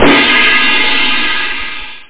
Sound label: cymbal